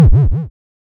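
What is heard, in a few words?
waveform FM triangle sine envelope silence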